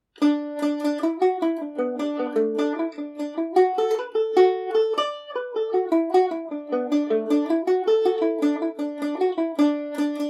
black frog dmajor, mandolin

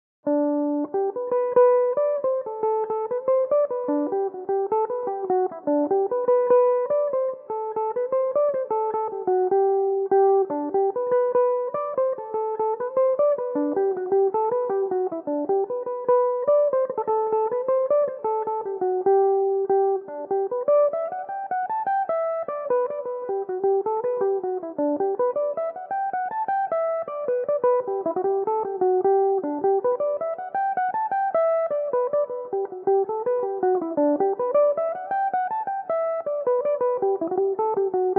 quinns jig G major, irish mandolin; Belmuse

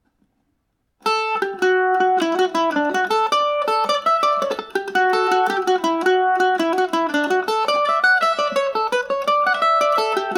jig, irish mandolin; Paddy Taylor's Jig; G&O #34 mandolin

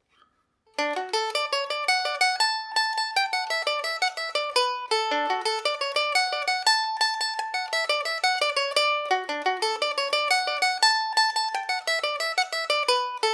domra version; Miss Benson’s Fancy; 1950s Ukrainian 4-string domra

